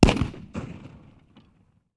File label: Cannon; Media-Pedia